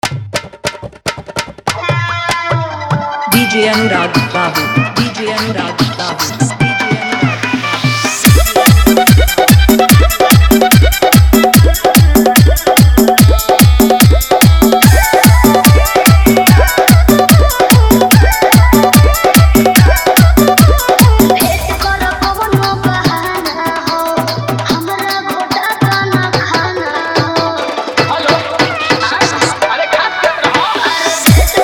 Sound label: Full Dhollki Bass Dance Mix